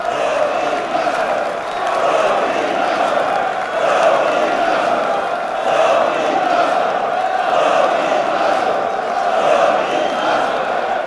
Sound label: crowd chanting at stadium